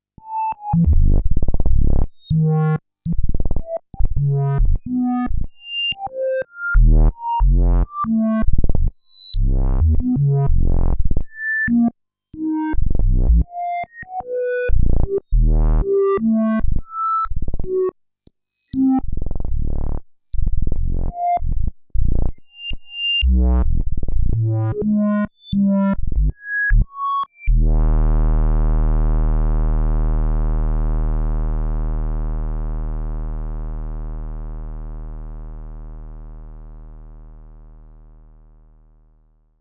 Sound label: synth bass; random midi notes player